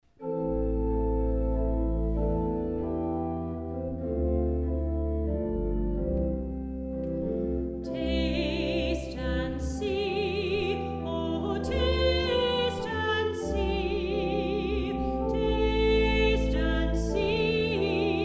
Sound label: Vocal